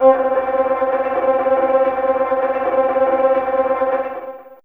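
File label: Violin Tremolo C3, Fairlight CMI IIe; TremStr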